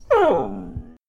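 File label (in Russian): стон гиены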